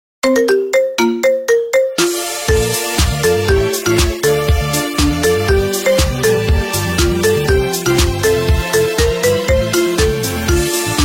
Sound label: Marimba